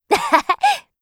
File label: laugh; Eternal Return; Hisui; KOR